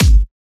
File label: Kick; FLPRO